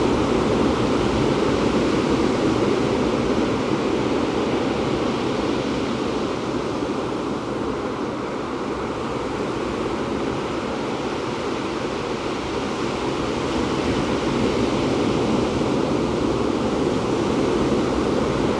Ocean; iOS Sounds

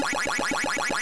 Web Page Loading In Progress; 8 Bit; ESAN VO Sound Schemes; 8 Bit Sound Pack V2

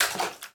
sewer step; Golden Crayon Sounds

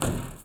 roof step; Golden Crayon Sounds

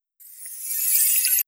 screen Curtain Off; NVDA; NVDA Ericson Sound Theme

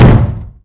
bathtub fall; Golden Crayon Sounds

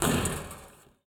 roof step; Golden Crayon Sounds

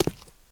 concrete step; Golden Crayon Sounds

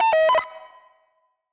team remove; Original TK Sounds

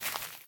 grass step; Golden Crayon Sounds

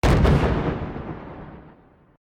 ultra bomb dist; Original TK Sounds

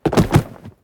brush fall; Golden Crayon Sounds

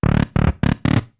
Folder Opened; Salem's Sound Schemes

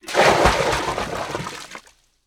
miss; RS Games Sounds; Battleship

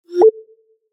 Announce Sent, iOS Sounds

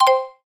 shelter alarm off; Original TK Sounds

